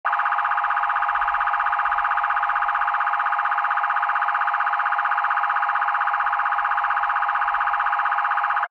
Siren, South African Sirens; TheWorkRoom Audio Post

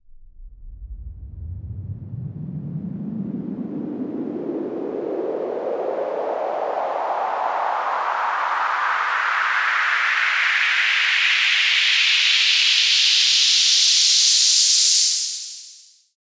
Medium Sweep, White Noise

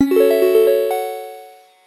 8-Bit SFX & UI - Eerie Notification; The Chris Alan; 8-Bit Complete SFX & UI

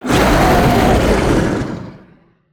Creature Dragon Roar; MatiasMacSD; CREATURES ZONE

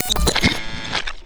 TECH INTERFACE Computer Terminal Beeps Negative; GameBurp; 2000 Game SFX

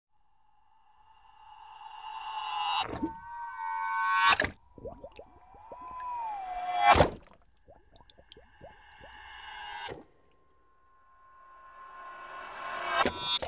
Space Fantasy, Alien Pods Land In; BBC Sound Effects Library

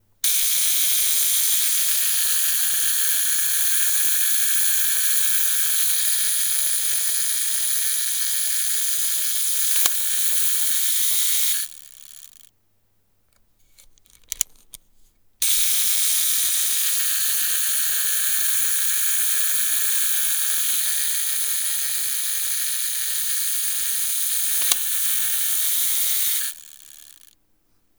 camera self timer mechanism, Old Cameras; mono; Soundholder

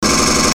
M41A Pulse Rifle; FXHome; Bullet and Gun

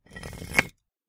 stone drag hit; Kenney's Sound Pack; Foley Sounds; Rocks

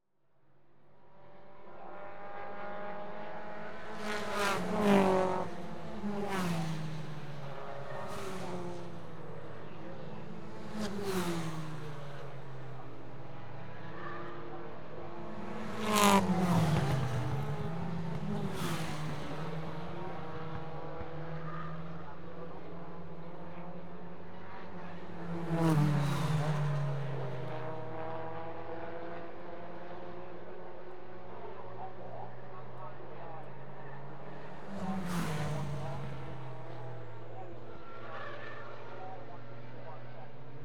Motor Racing Saloon Cars Passing; Sport Leisure; BBC Sound Effects Library

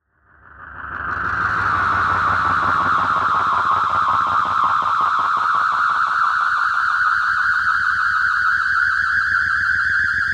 Feed chorused, Feedback FX; 99Sounds; Dark Engine

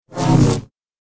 Double Bladed Saber Sound; FXHome

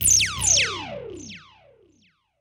Feedback Synth Delayed Evolving Tones; Sound Spark LLC; Analog Synth Volume 1 Bleeps, Sweeps and Creeps

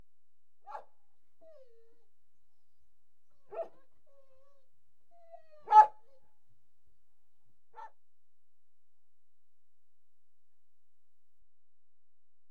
Two Dogs Barking, One Whining; Horses & Dogs; BBC Sound Effects Library

